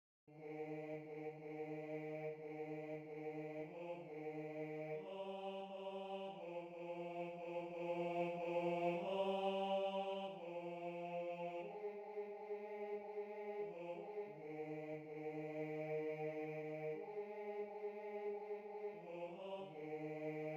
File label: Bass I; I m Troubled